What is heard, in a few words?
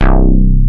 Moog Bass Hit